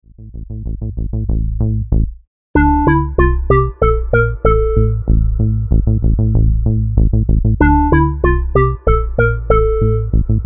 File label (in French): melodie basse